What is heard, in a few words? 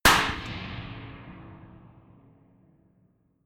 gun slapping pop gun